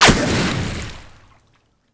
water hit, damage; DCS

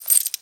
animal dog collar short; Call of Duty Modern Warfare

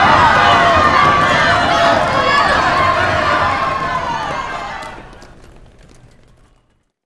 airport crowd stairs end; Modern Warfare

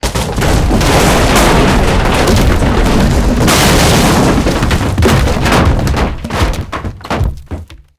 wood building fall mid

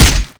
punch human

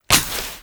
flare ignite; scn mp tacinsert flare ignite

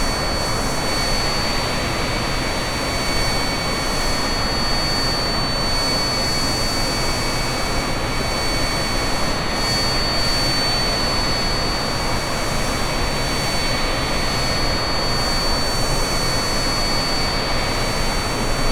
Aircrafts Engines Engine TV3117 R; DCS

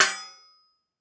machete strike; Rust

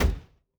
Aircrafts F-86 Canopy Open End; DCS

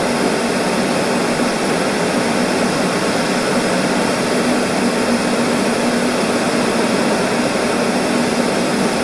Aircrafts Engines F15 In Engine R; DCS

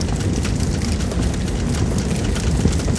Tiger burn mid; tank burning; World of Tanks Blitz

